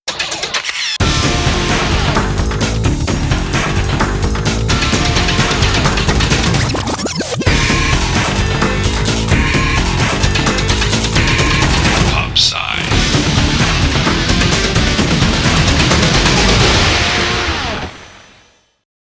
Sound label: Theme